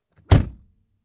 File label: Closing Car Door